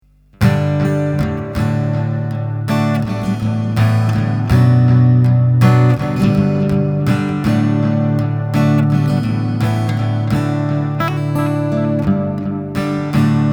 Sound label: ZOOM AC 3 output Dove Tape Delay